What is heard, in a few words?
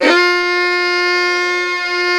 violin F2, Balkanish Traditions